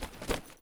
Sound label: use; Escape from Tarkov